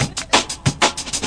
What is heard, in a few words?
chopper, DRUM LOOPS and BREAKS, 186 bpm